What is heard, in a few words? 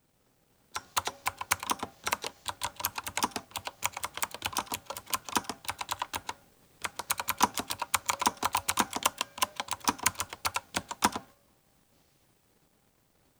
keyboard; azio